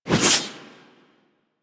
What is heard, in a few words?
Warfan Whoosh; Warframe; Weapons; Melee